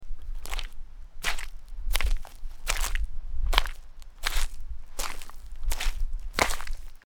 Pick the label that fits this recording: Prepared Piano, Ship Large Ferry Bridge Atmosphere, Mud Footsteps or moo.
Mud Footsteps